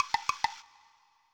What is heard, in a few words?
Collect Egg; Nintendo 64; Clockwork Kazooie Egg; Banjo-Tooie